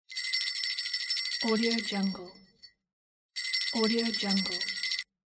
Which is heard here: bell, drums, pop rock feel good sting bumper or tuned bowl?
bell